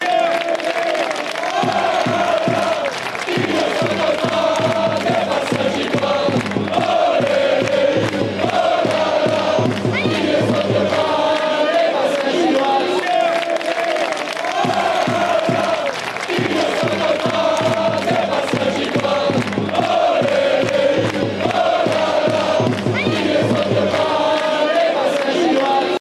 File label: olele olala, fanchants